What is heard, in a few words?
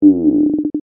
chant